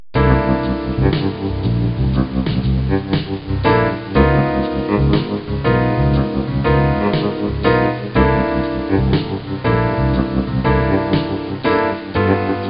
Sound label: Unused BGM